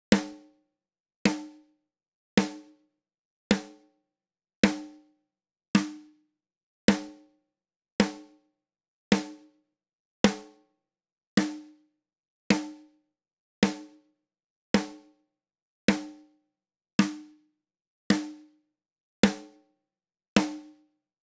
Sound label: snare top; AugustRain